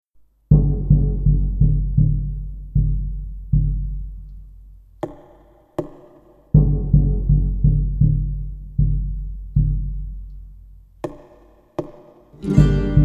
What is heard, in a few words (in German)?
ohne vocals